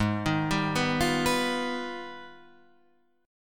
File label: Ab Minor Major 13th down Guitar Standard; arpeggiated; JGuitar